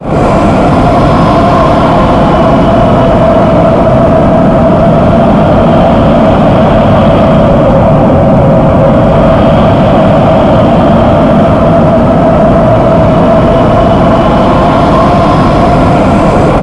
soul idle pre grab

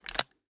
arrow load, bow; bow deerhunter

